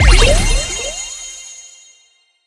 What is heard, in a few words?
Claim Reward Daily Mission; subway surfers city sound effects; Android, iOS